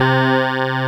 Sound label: Synclavi; Best Service Gigapack 1